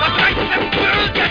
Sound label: kick; sfii